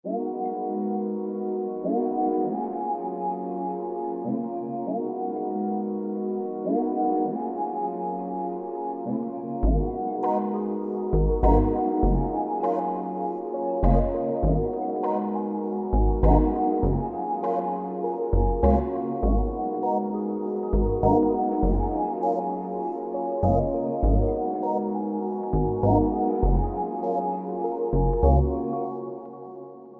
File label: 100 Gb major R B pop